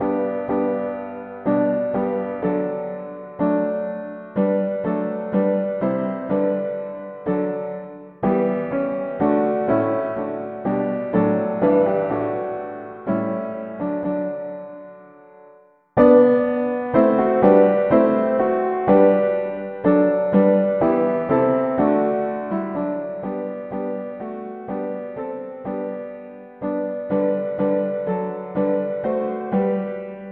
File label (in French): mono piano; hymns